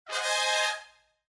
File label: Exclamation; Windows 7; Festival